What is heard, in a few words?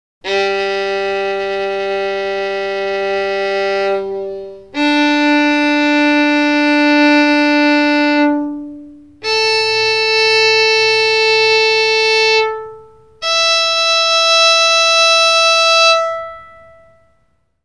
tuning check fiddle; Hear all strings in sequence